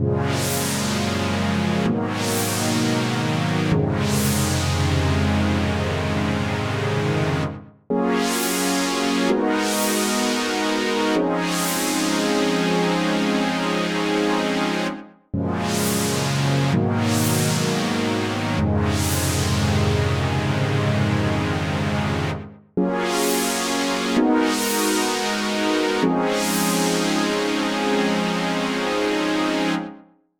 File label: pads; Pony Fan Music Remix Stems